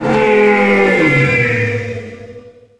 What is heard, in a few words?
dreadshark die; DAoC